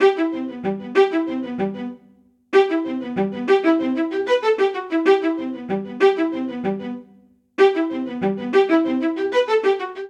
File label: Ostinato Intriguing Forest G Major 95 bpm, String Quartet; MIDI Clips; Ableton; Spitfire Audio